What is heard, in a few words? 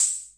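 hammond auto vari 64 hi-hat; 1974; drum machine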